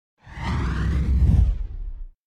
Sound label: Fire Ball Passing Flying Fire Ball; Hollywood Edge; sound designer's toolkit v2